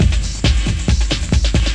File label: CAVE; Macworld (1998-10); Serious Demos; Morpha; 3DO